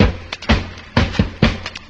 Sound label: clickers; Drum loops